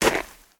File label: Step Snow